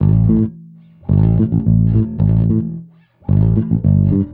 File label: 110 Pop 70s Bass Am, E-Bass; Pop Rock